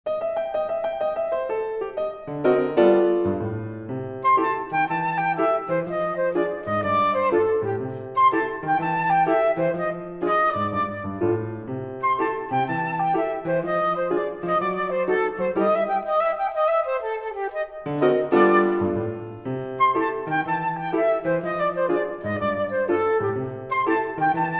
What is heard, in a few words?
performance Flute; Bartlett; ABRSM